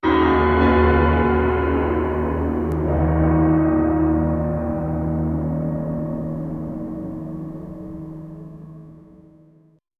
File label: piano leitmotif with horr, horror ambience